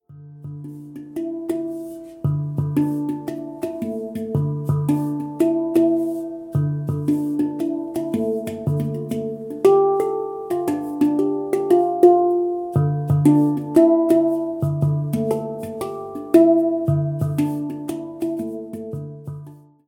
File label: handpan